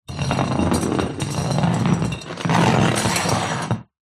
foley large empty clay demijohn roll on tiled floor